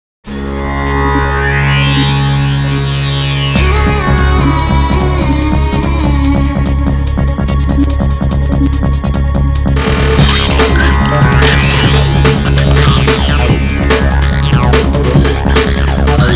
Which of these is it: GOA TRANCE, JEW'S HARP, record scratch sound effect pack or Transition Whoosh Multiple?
GOA TRANCE